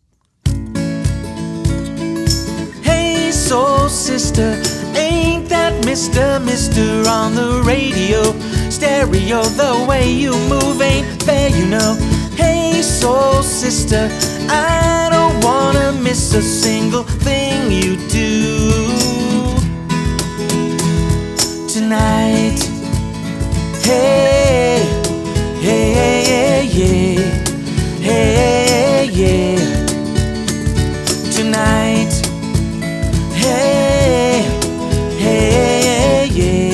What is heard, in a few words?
w perc